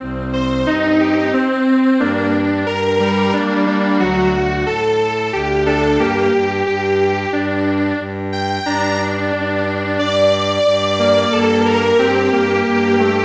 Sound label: Strings Quartet; Dandansoy